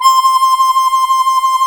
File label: HARMONICA